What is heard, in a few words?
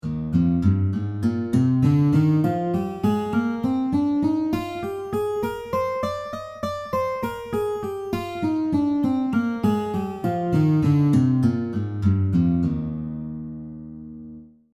C Major